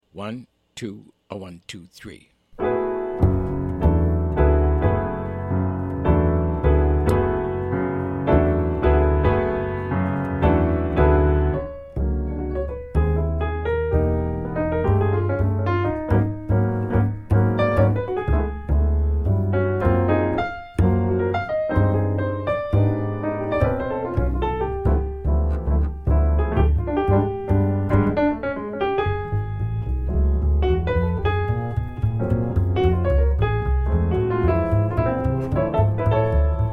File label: minus drums; count off sets up the intro; play figures for the intro